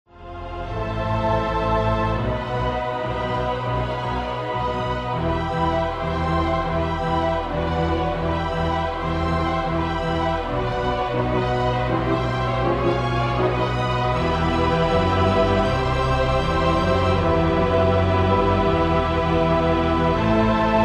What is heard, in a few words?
panic